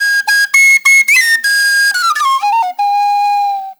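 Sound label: PENNY WHISTLE; Spectrasonics; Heart of Africa 2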